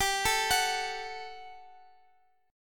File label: G Major 7th Suspended 2nd G down Guitar Open F; GM7sus2; arpeggiated